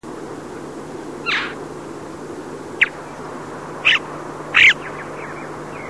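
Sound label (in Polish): wieszczek, krukowate - corvidae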